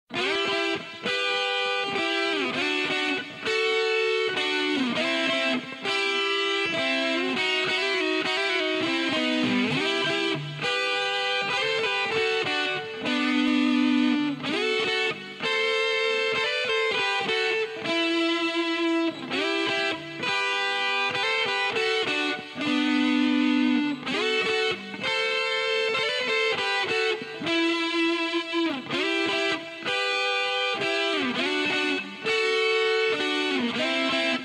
Solo Guitarras

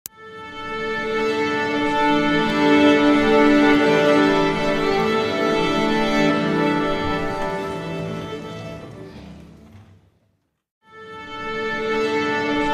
Efectos de sonido Orquesta afinando

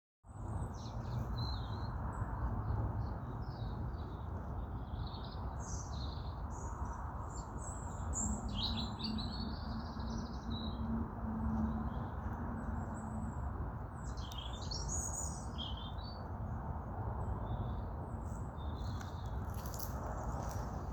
Sonido tranquilo

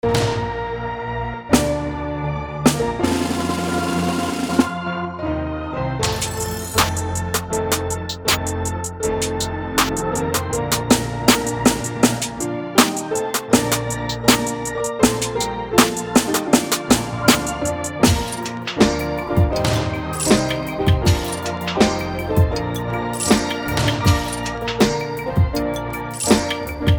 HIMNO TRAP

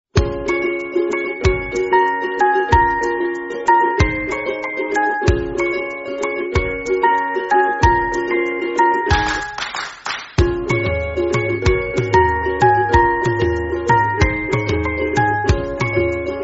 Música De Fondo Para Videos De Niños, Música Feliz, Música Para Publicidad

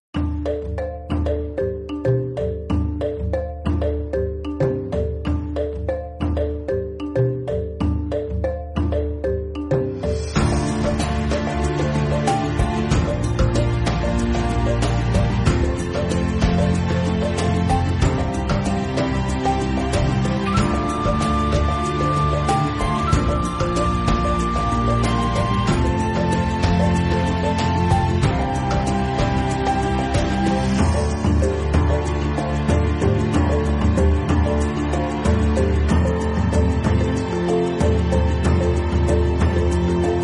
Música Relajante y Animales en la Naturaleza

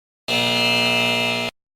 sonido de Error

